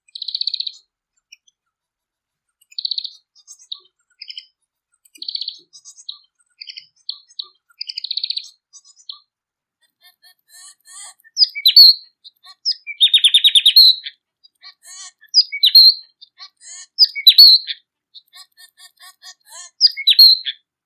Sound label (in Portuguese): Canto do Manon